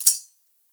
percussion oneshot chandelier; Pop; Drums; SD PASADENA Pop Sample Pack; Slate

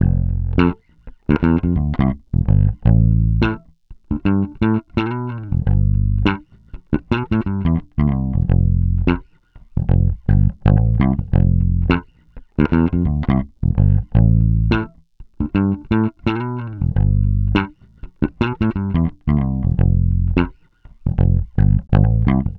Bass loop 85 Am, Electric Bass Loops Direct; Slate Digital BASSHOLE Sample Pack

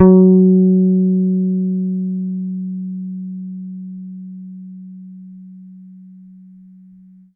Bass High F#, Electric Bass One Shots; Slate Digital BASSHOLE Sample Pack

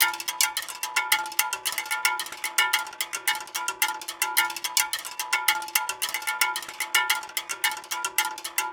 cowbell loop, 110

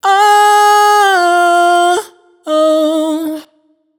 riff Medium Oh 97 Ab wet, Vocal; Slate Digital Omnivox Vocal Sample Pack

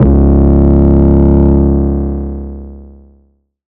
808 Bass Clasher Db3; Slate 808 Deep Dive; SD Signature 808s

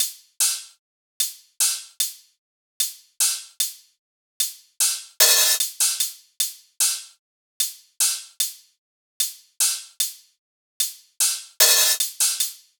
hat loop 75, Drums; Pop; Hat Loops; Slate; SD PASADENA Pop Sample Pack

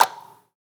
clap one shot; waves; Slate; SD PASADENA Pop Sample Pack

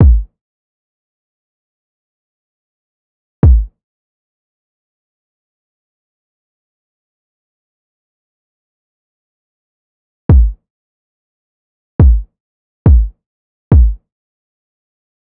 kick 70, Kick Loops; drum sample pack; Kanaga; SEISMIC; Slate

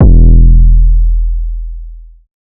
808 oneshot Bong E; Slate Digital BASSHOLE Sample Pack

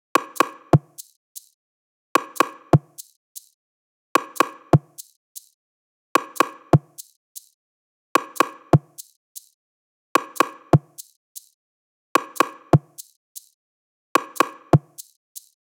perc 120; Drum Sample Pack; Loops; SD SEISMIC; Slate

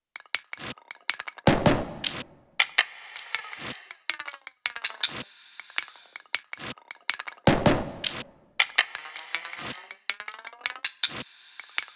perc loop 80, Perc Loops; Pop; Drums; Slate; SD PASADENA Pop Sample Pack

